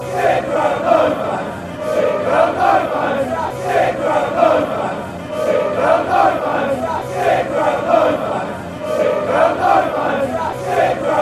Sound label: chants; sit ground no fans aldershot